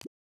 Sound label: Character Sit